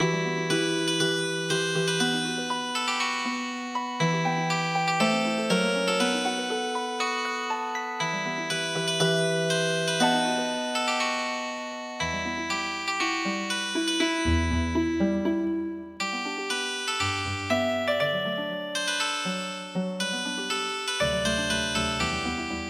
Healing Harp

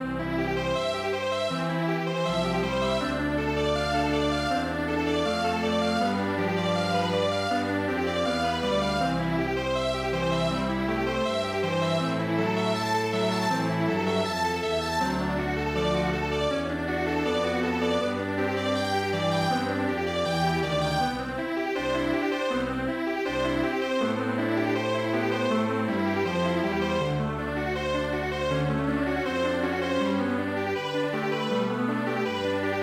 Strings Quartet; Das Wohltemperierte Klavier

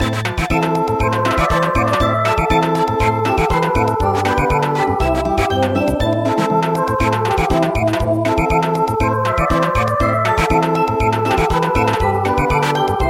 Samba

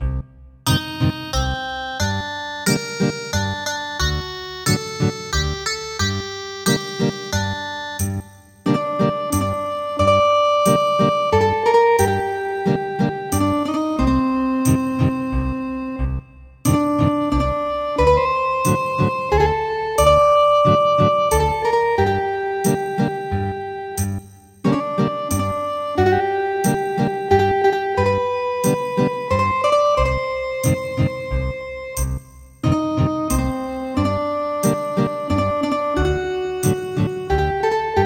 Ethnic Guitar; Dandansoy

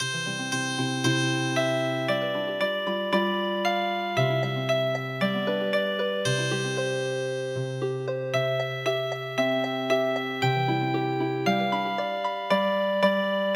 Healing Harp; BJORNEN SOVEN

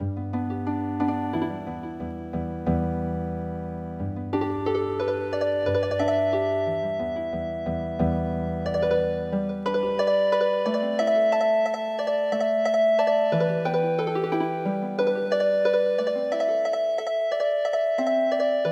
yagibushi Healing Harp

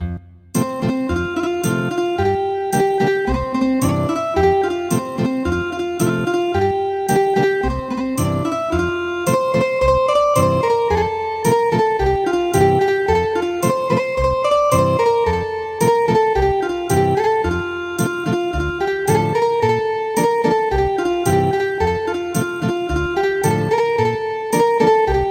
Ethnic Guitar; El Rotlleto

